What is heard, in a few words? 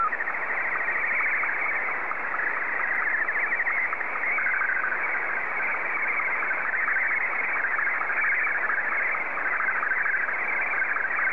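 Martin 2, slow-scan television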